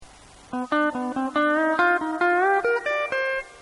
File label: Minor One